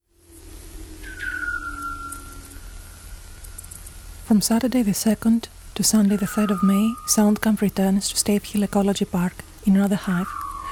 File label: jingle; REVEIL 2015